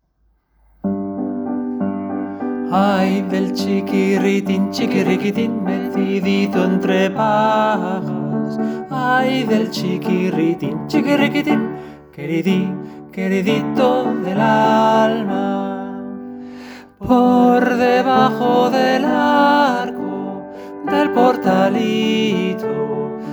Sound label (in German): Sopran; Ay del chiquirritin